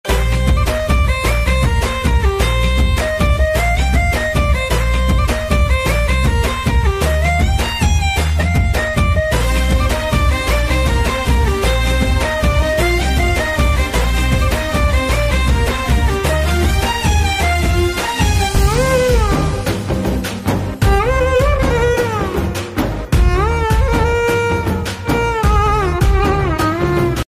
love bgm ringtone; thandel; naga chaitanya; sai pallavi

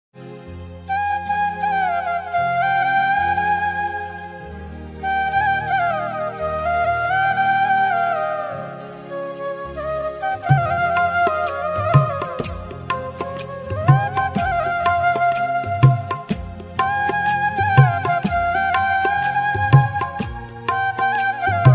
bansuri ringtone sad ringtone new flute ringtone

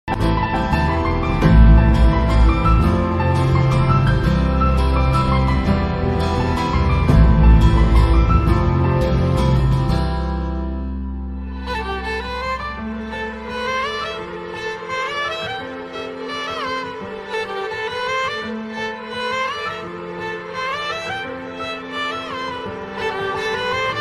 love bgm ringtone; remo love bgm ringtone

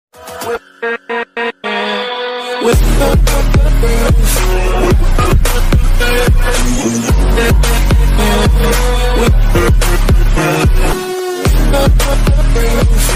english ringtone attitude ringtone

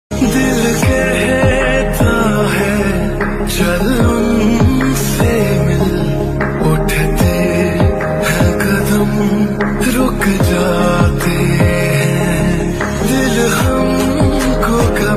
sad lofi; heart broken

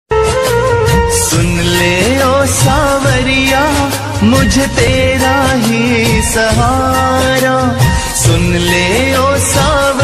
radha krishna ringtone; shri krishna govind hare murari; shree krishna serial

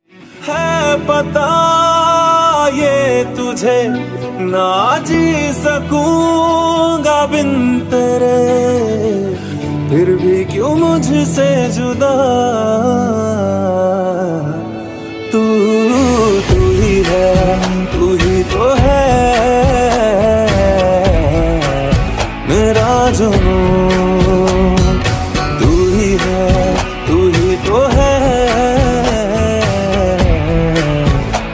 ringtone; half girlfriend

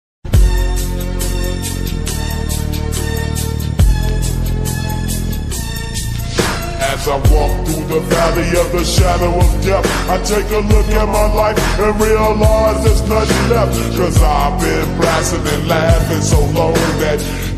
ringtone slowed reverb

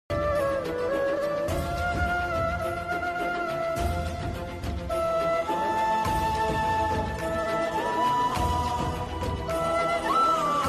krishna flute ringtone

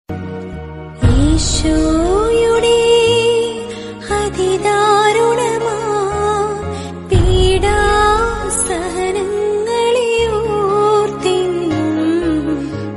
malayalam mood bgm; good friday

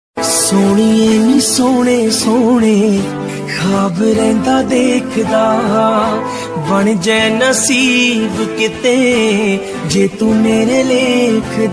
punjabi song ringtone

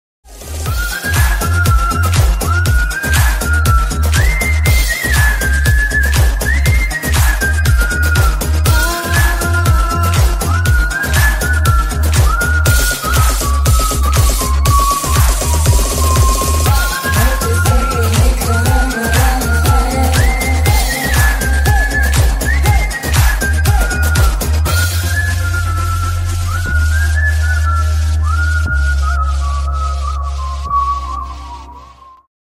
mungaru male remix ringtone

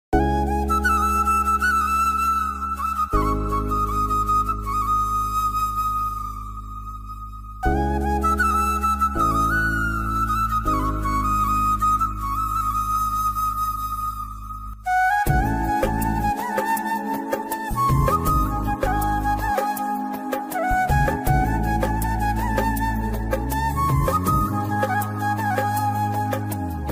flute tune; thalapathi vijay; bsfeditor

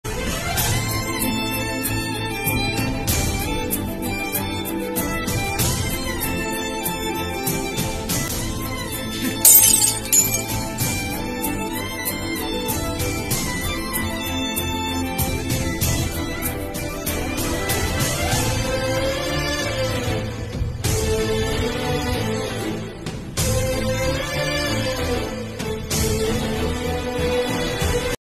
kiss scene bgm; Rowdy Boys kiss scene

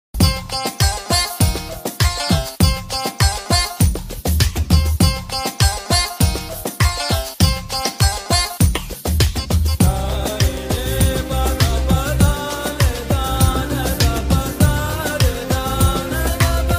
bgm ringtone tamil bgm tamil love bgm

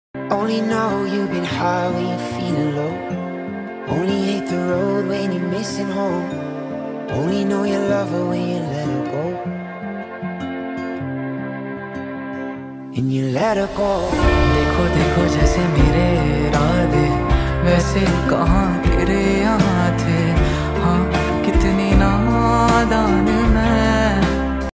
hindi x english lofi

